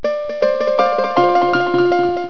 tinkle

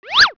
zip; Microsoft Graphics Studio; Home Publishing 99

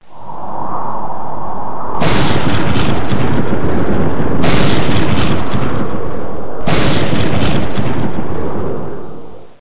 storm; Busy people of hamsterland

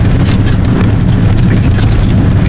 wheel asphalt; CRC2005; CRC 2005 Demo

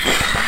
squeal; 3D Game Programming All in One

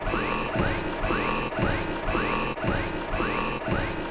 legs run; Star Wars DroidWorks

